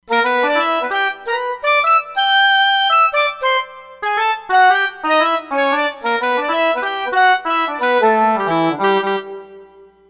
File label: FS WindWood, Roland Fantom XR